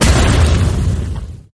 skill bullet; drunkard

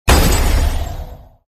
skill bullet; ghostfire

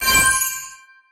sign Exp Up

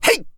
attack; acheron

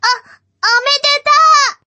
win; fight Result

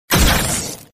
sound attack hit; hitsound

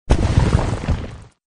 skill crash, sand

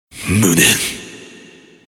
die; ninsover

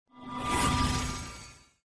skill bullet

